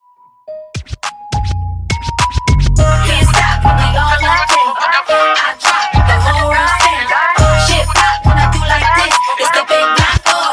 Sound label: Hip Hop Mixshow 103 BPM; HipHop Mixshow In Outro